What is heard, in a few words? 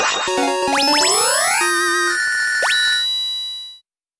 Item Received